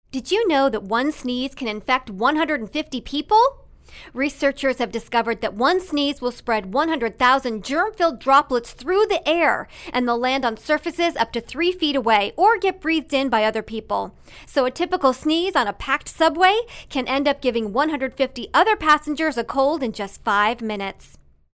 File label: Sneeze